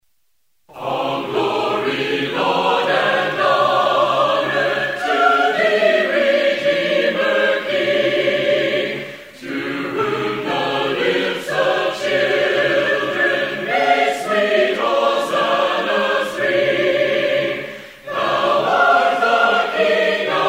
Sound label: All Glory Laud and Honor, hymns